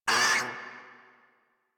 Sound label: Kavat Vocal Pain; Warframe; Khora